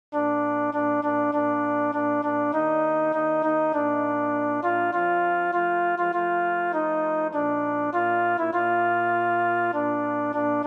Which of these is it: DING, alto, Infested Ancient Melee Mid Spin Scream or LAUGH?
alto